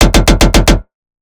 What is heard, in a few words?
intellectual tearout bass